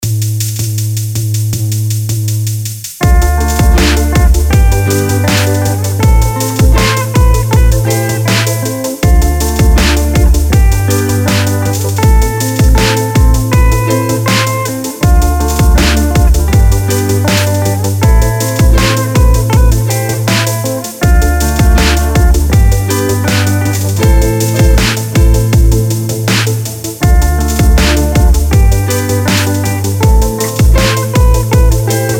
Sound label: gramma trap loop; a short guitar session, accompanied by a trap beat.